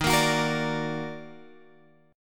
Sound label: Eb Minor Major 7th Eb down Guitar Drop D, chord